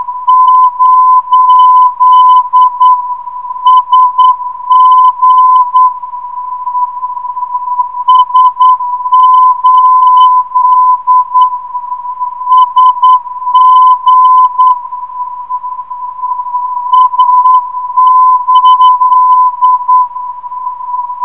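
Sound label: Z 409kHz, non-directional beacon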